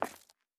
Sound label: Footstep Concrete Walking